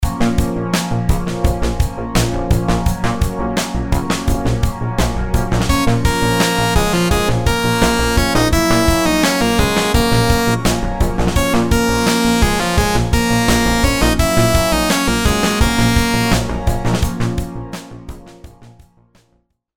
rhythms simple dotted 8s 85

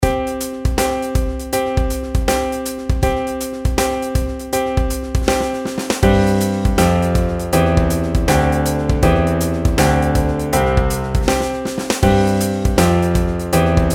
pitch patterns stepwise; melody

